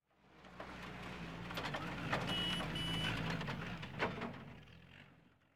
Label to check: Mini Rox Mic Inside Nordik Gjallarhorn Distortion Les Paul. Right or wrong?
Wrong